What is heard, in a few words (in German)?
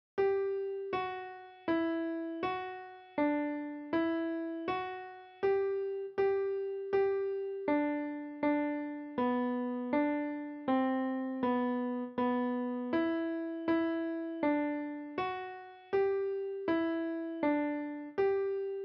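Vom Himmel hoch da komm ich her, Klavier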